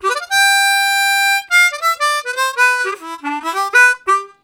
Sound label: Faster, Harmonica, Riffs; Zero G - Harmonica